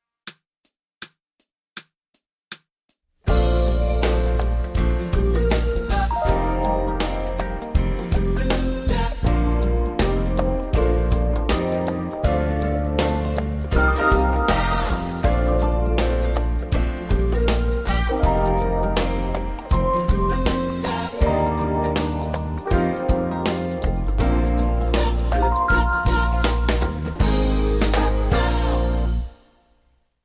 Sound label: Lightly Row, Band harmony; SilverGate solo songs